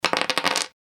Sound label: the die is cast